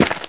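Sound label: dirt, footsteps; hl2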